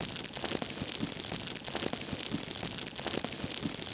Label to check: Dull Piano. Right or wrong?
Wrong